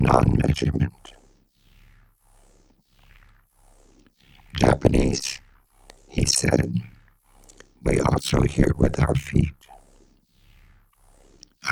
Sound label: frequency splatter